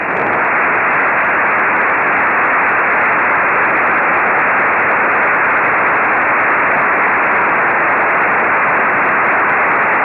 gmsk beacon; xw-2c; 145mhz770

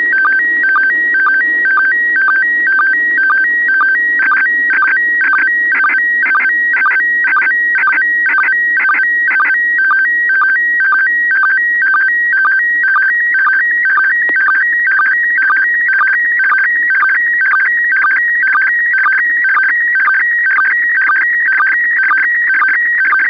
sstv 145MHz800 mono; iss

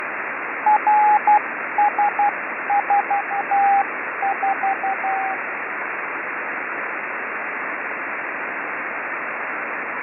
CW beacon

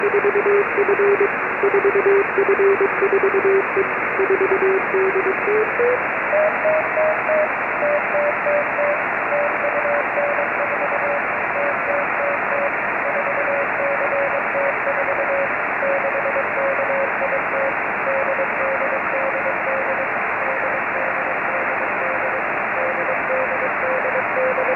cw beacon 145mhz972; xw-2f

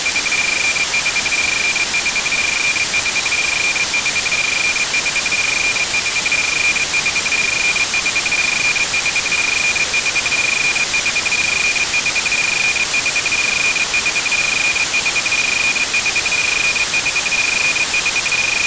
beacon wide; MERIDIAN 6; 7600 MHz

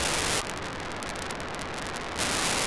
2k4 GMSK, 1x beacon; RS14S (CubeSX-Sirius-HSE); 437,050; USP

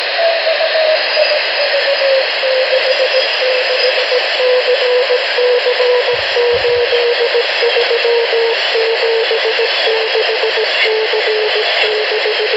CW Beacon; CUBESAT XI-V; CO-58; 437.465 MHz

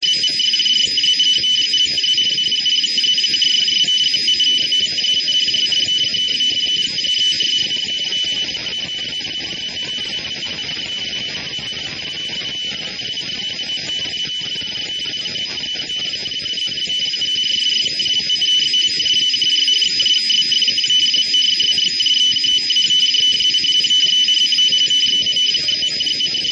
149 910MHz; Cosmos2184

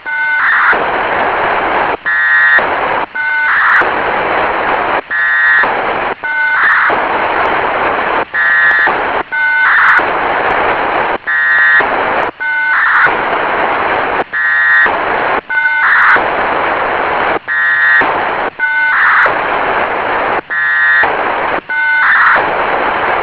TUBSAT-C 436mhz075; maik hermenau; DLR-TUBSAT

